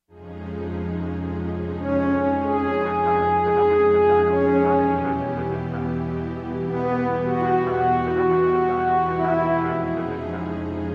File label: bgm orchestra